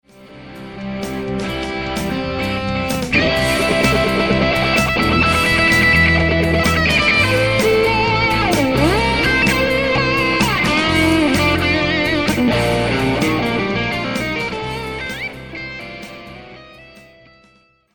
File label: classic wah lick